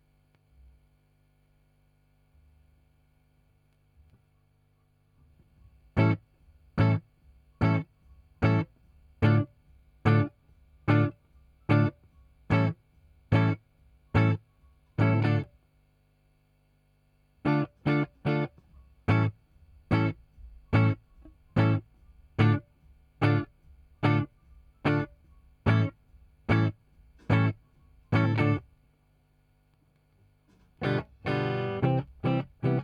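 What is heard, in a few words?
Guitar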